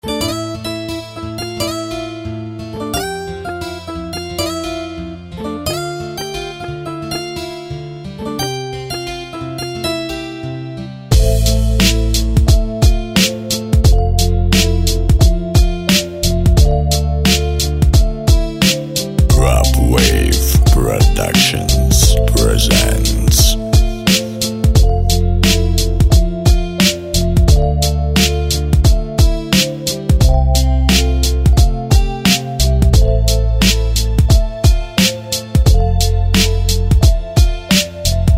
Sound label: Melodic Guitar Beat